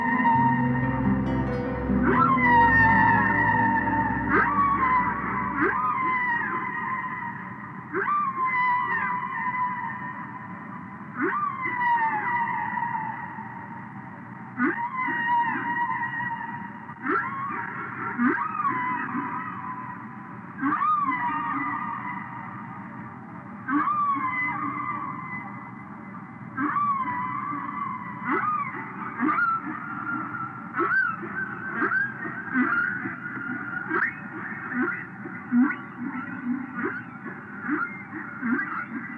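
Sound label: magical sounds of whales, humpback whale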